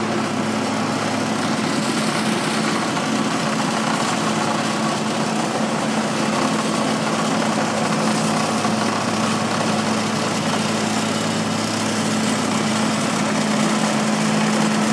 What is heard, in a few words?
broadgate at night coventry